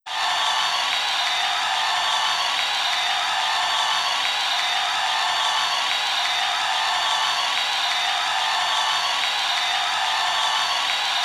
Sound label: Crowd; paper mario 2 the thousand year door